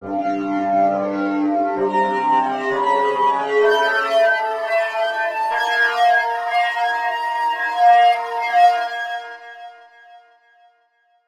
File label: BANJO